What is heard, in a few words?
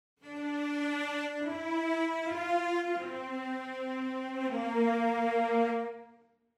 Strings 6 Ci Legato